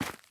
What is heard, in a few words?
Footstep Dirt